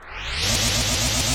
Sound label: Laser Charge